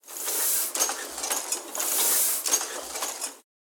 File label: Ambience Scatter Machines; Santuario